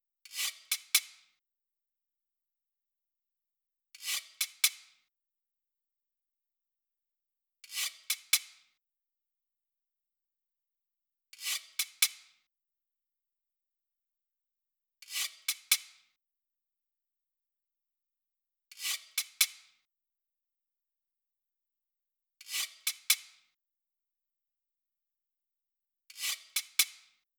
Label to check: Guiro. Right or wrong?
Right